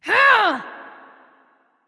grunt; Smite; athena

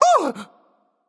surprised, voicepack; smite; jorm

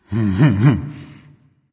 heh heh, voice; Smite; ymir skin12

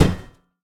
intro mace; smite; vulcan

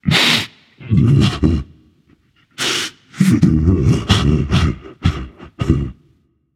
boo hoo; smite; ahmuzencab

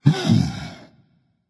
thinking berserker; cuchulainn

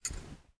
armor; smite; osiris

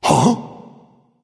surprise; fafnir

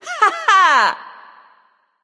hehheh; smite; pele

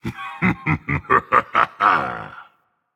laugh, dragon; fafnir

